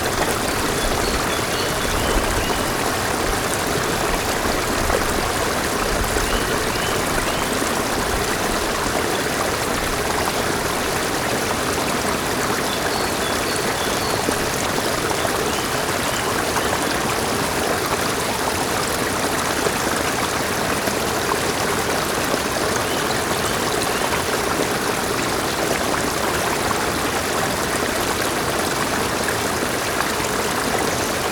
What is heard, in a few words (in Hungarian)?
ateresz, zsilip; menesviztarozo